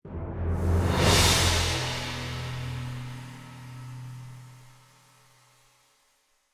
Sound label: Final Boss transition and end sting